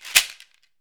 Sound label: SHEKERA, African perc; AKAI S6000 CD-ROM - WorldSounds